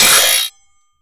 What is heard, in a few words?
manhack grind; npc; Half Life Alyx